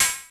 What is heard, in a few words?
HIHAT, TECHNO; Crypton - DrumBase5000; TECHNO #2